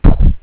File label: bang